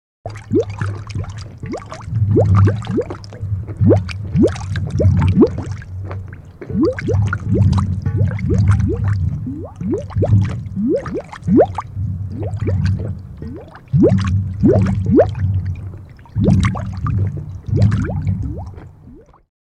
Bubbles Large Deep

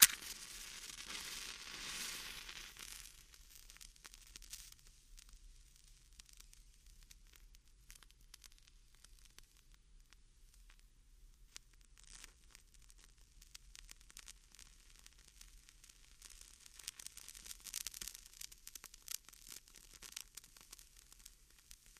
Match Light Strike; lighters & matches

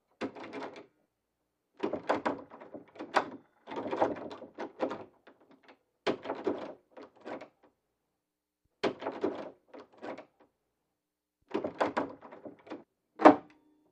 Door Knob Shaken Rattle

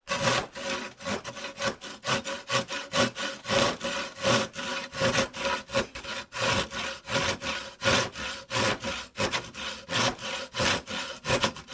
handsaw sawing

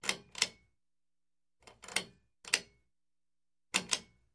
Pull Chain Sequence 1

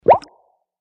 Bubble Pop Wet Deep

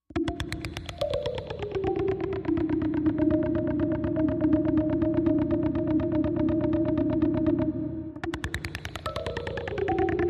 Trickle Doubt Machine, musical

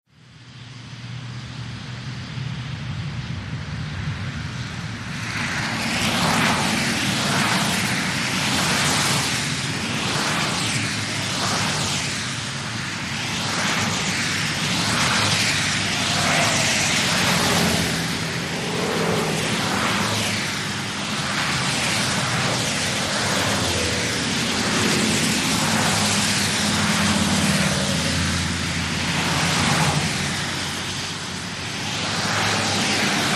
Traffic Big City Ext

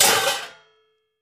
Junk Thrown Box, junk crashes

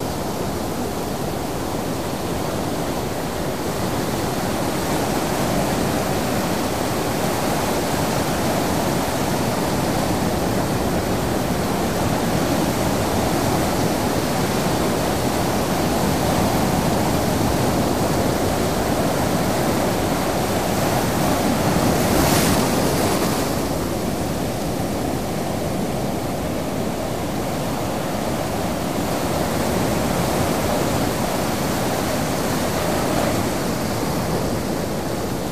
Surf Roar Big Slw Cras, waves & surf bg

